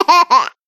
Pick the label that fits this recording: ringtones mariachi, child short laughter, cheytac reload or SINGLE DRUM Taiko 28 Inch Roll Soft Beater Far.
child short laughter